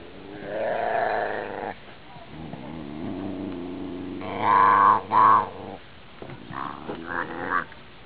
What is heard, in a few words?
ocelot hungry